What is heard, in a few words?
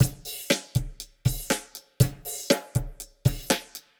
120 Hit it, Warped Live Drums; Loops; Touch Loops; CosmicFunk